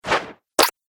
Custard Pie Splat Throw; Cartoon Sound Design Sound Effects

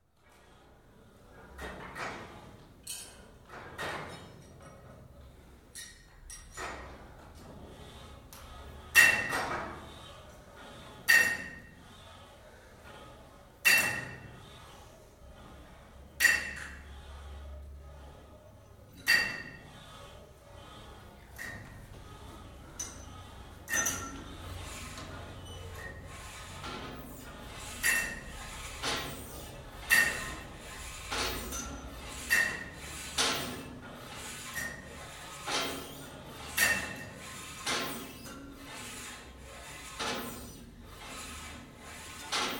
Multigym, two people working out; Miscellaneous Sport; BBC

